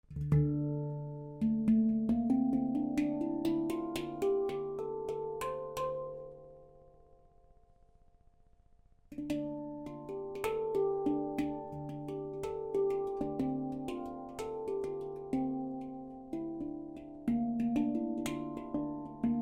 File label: D Kurd grave, handpan grave re kurd 440hz